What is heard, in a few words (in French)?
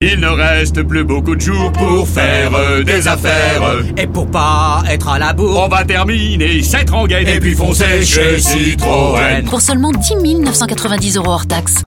chant; CITROEN